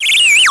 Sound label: Whistle